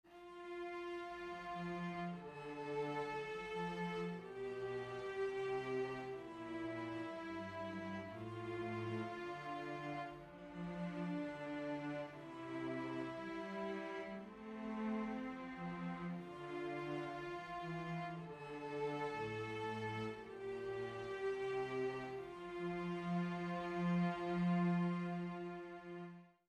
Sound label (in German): Zweistimmig. Zweite Gattung.